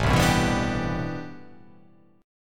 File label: A Minor 11th A, Chord